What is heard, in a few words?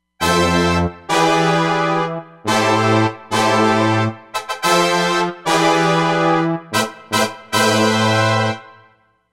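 Heavy Brass, Roland Fantom XR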